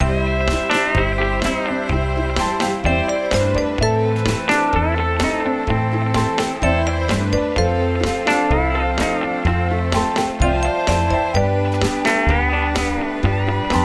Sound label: Perly 127bpm, Loops; audiocam music